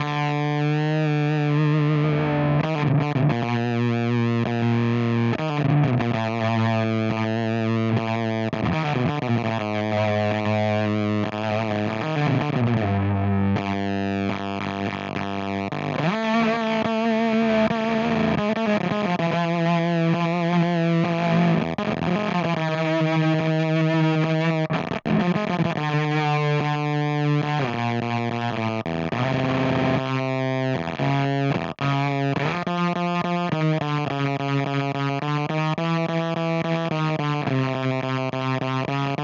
Amplitube X-Drive Fuzz Face

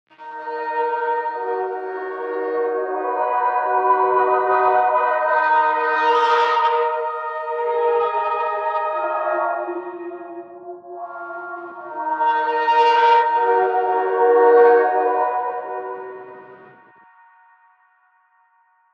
Pads Shred Space

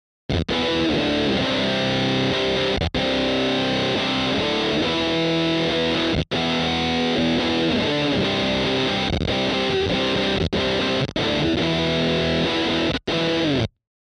DFST-1 Chords

